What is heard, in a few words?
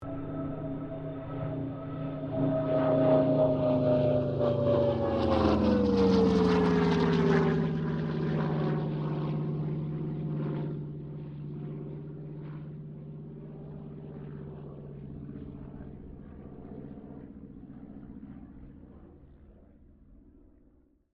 AIRCRAFT CA-13 BOOMERANG PASS STEREO